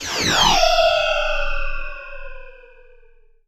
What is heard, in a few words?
Lazers